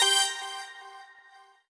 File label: normal hit normal